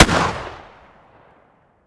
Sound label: shotgun sup fire atmo ext; gmod; viper